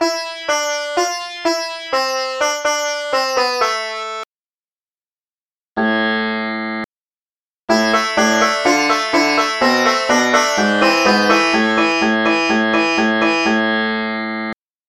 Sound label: sitar